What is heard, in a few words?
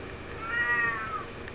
yowl